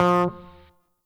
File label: Pack Slot, BardInstrumentPacks; Warframe; Grineer Pack B